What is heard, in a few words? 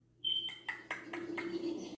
Alpaca clucks